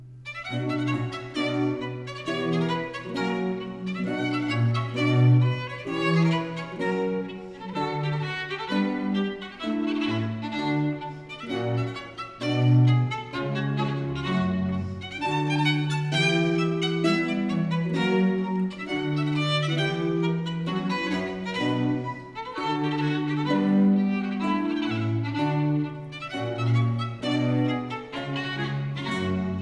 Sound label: Ayres for the Violin, Sonata in C Minor, Gavotta; Book 1; Italian Baroque Violin Sonatas; Nicola Matteis; Ayres for the Violin, Vol.1; 尼古拉斯 · 麦克吉根